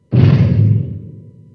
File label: step